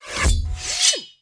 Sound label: Drop Mine; PC Gamer IT CD 32; SWARM